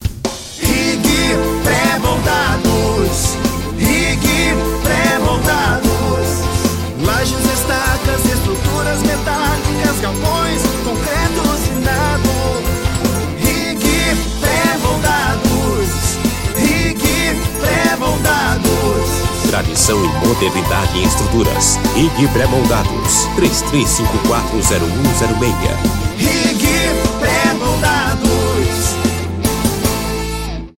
jingle

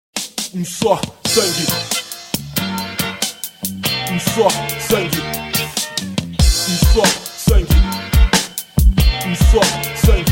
beat

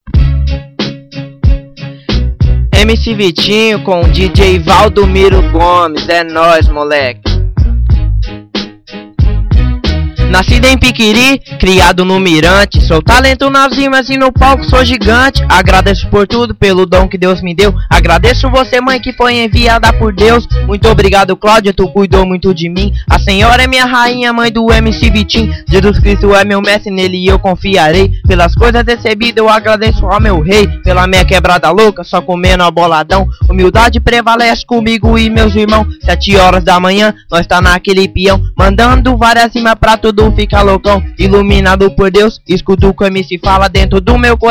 funk

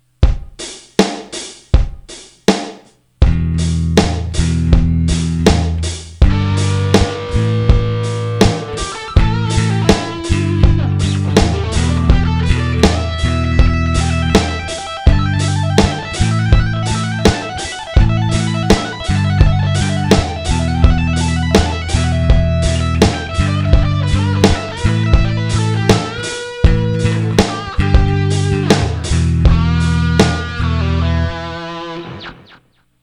ripper bass

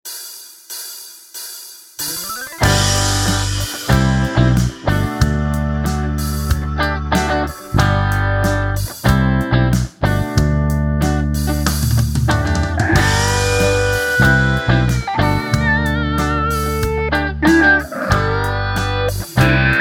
instrumental

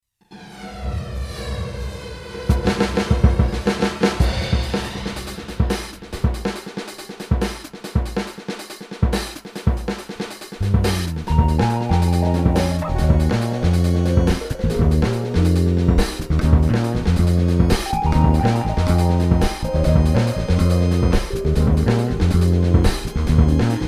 moog

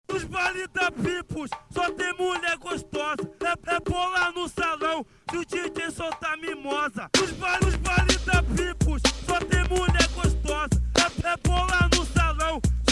funk

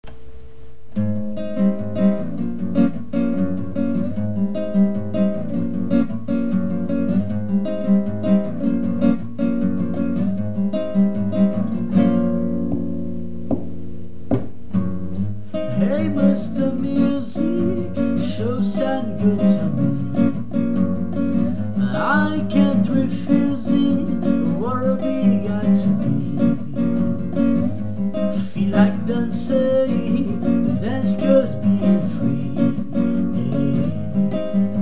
country samba

